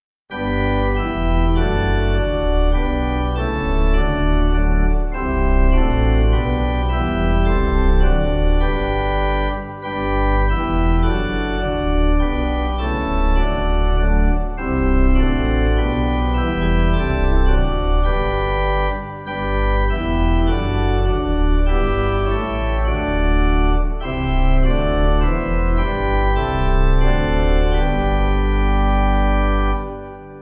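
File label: pipe organ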